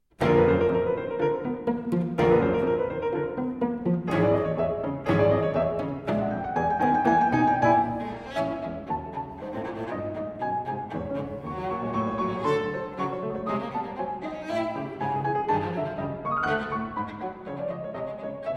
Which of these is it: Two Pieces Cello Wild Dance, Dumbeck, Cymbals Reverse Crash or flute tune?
Two Pieces Cello Wild Dance